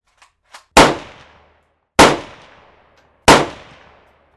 7.62 caliber AK47 shots

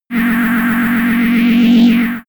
buzzy energy

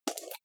jar plastic midsize open fast

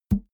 balloon hit head

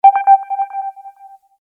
console control

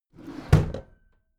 packed drawer smooth close heavy halfway

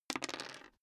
dice roll table clatter

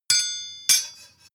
sword ring scrape

